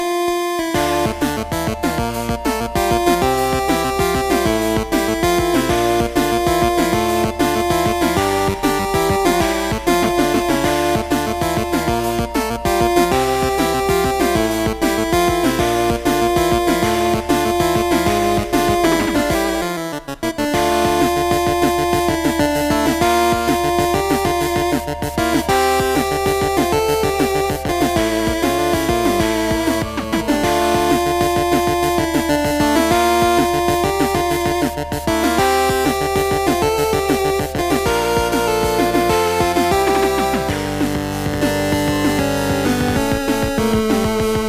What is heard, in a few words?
8bit